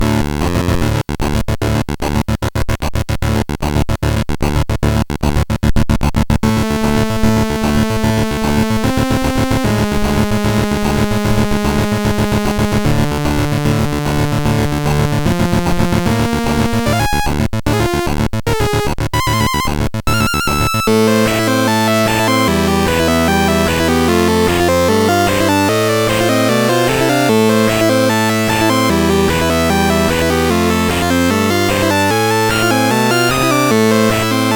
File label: Delta Man, CSG8580R5